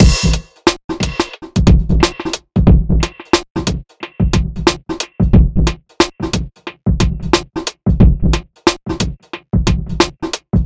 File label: Abstract Electrofunkbreakbeats 090bpm